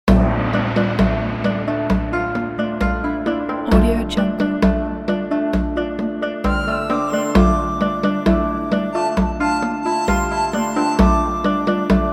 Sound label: ancient middle east